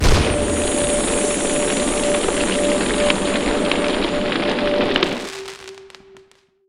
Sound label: Unicorn Horn; LooterKings